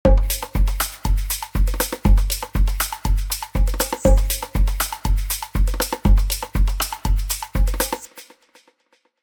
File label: Percussion; UVI Percussion Factory